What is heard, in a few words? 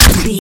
gun silenced rifle 3 shot